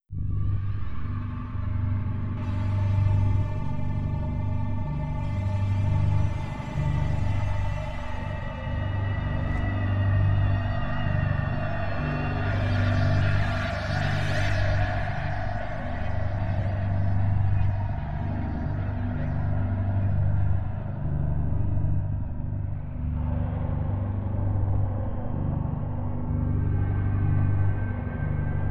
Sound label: Creeping INSTRUMENTS